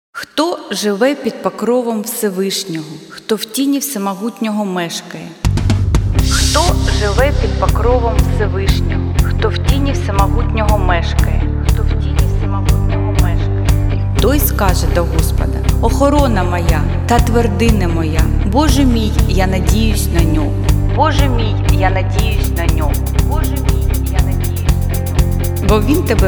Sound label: Psalm 91 ukrainian version